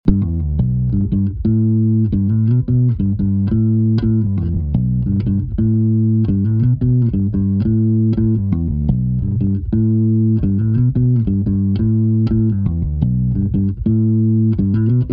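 Multiband Compression on Bass Guitar OFF Bass is present but less solid and the mids are muffled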